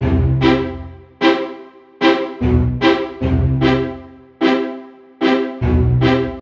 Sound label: Strings